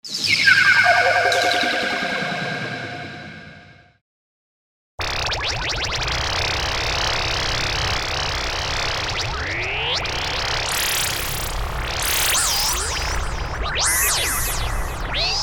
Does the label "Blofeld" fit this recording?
Yes